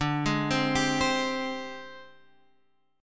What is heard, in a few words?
Ab Major 7th Flat 5th D, Guitar